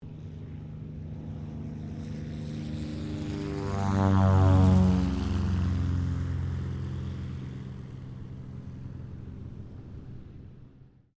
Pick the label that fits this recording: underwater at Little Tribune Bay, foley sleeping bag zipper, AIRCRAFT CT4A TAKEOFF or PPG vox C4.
AIRCRAFT CT4A TAKEOFF